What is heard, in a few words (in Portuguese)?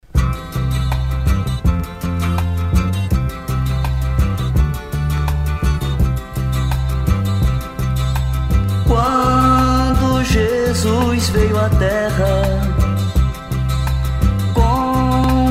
Aleluia Coro